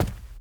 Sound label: run carpet